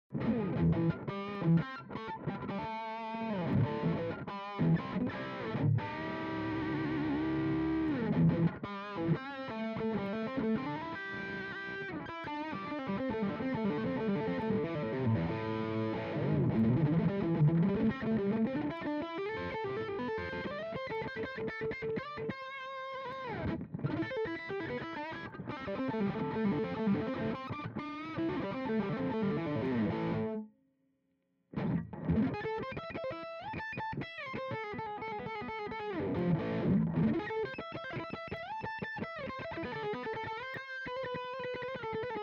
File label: ribbon dry